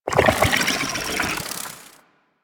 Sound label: Infested Fishing Fish Caught; Warframe; Infested Fishing Spear